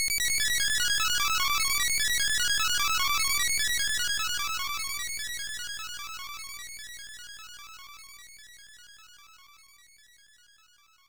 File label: Boss Destroyed; Holy Diver; Family Computer